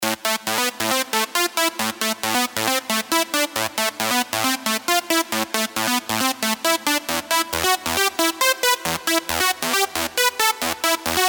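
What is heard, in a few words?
synth, serum super saws; Sequel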